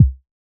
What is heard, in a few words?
kick; bespoke - Floral v.1; drum oneshots